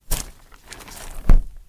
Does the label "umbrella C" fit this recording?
Yes